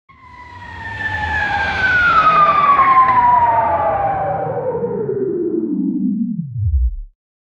the sound of a futuristic spaceship whooshing through space